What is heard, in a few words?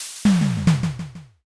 press